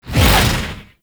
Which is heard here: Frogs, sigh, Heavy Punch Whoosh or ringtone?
Heavy Punch Whoosh